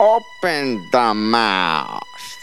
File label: Open mouth